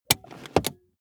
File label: car bmw e46 interior handbrake release short